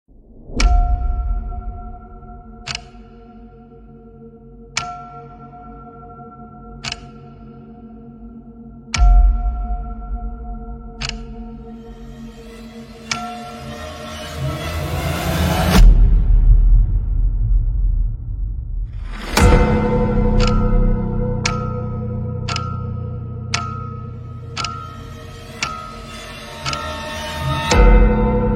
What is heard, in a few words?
escape room